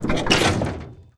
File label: snowmobile suspension light; Call of Duty Modern Warfare